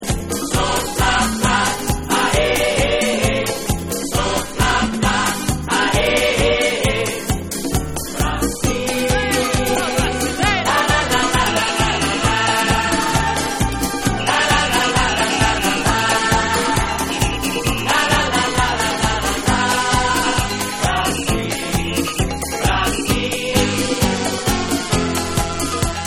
DISCO SAMBA

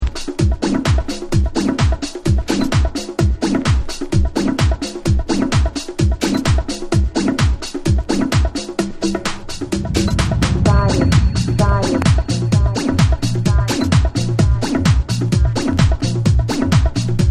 BEAT CONTROL

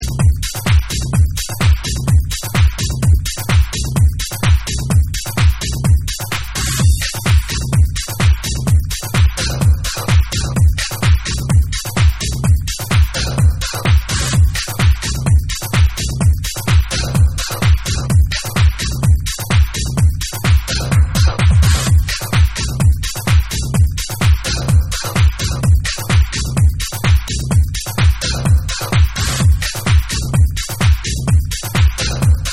COSMIC MORSE CODE Morse Beats

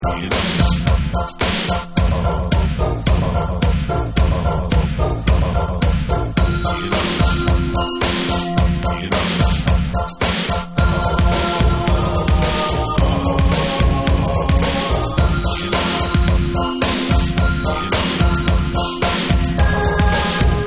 BEATBOX

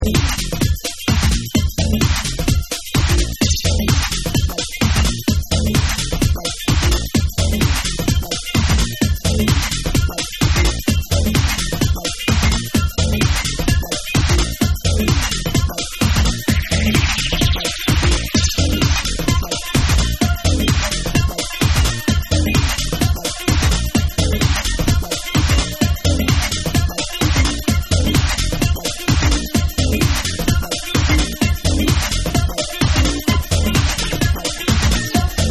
FUNKY FARM

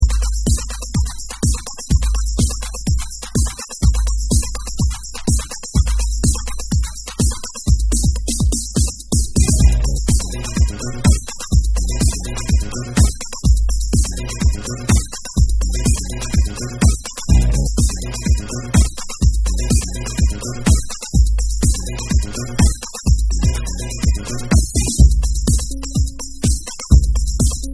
Instrumental

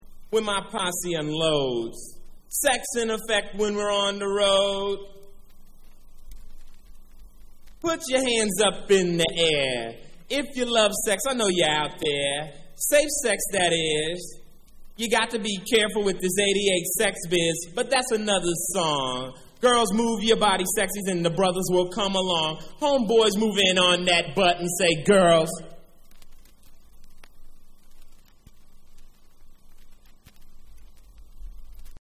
Acapella

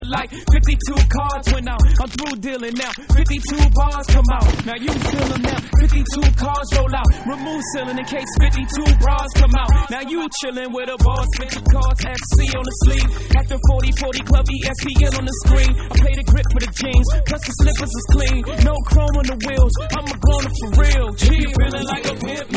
Reggae Mix

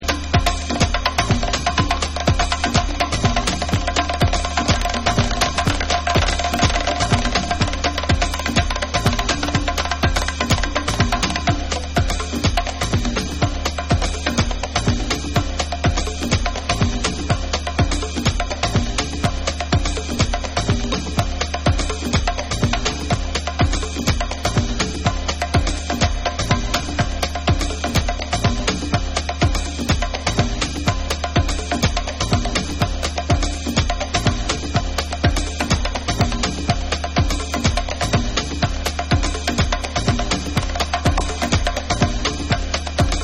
SOUL DRUMS

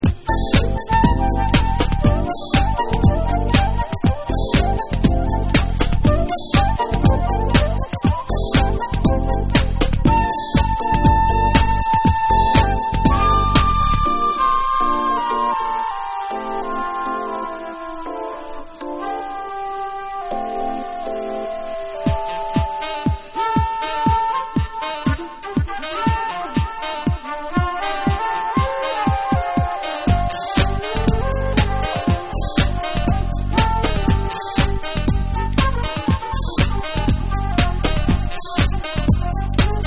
Sax Mix

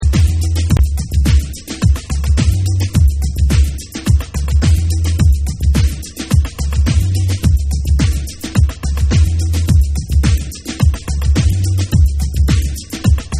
BASS Mix

